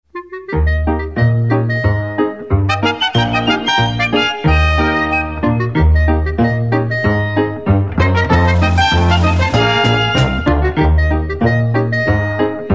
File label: ringtone